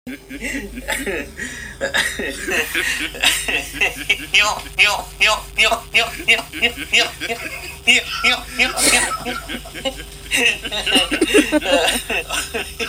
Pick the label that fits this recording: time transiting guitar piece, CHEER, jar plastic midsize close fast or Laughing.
Laughing